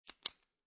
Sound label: Pen Click Open, Office, Pen Click Open; Pens; Offices